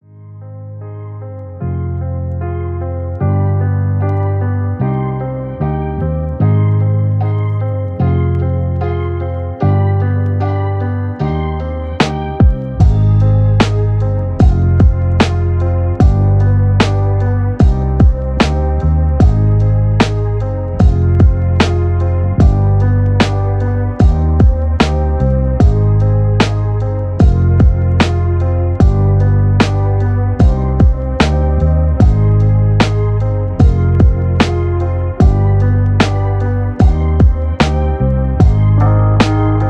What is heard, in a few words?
Lofi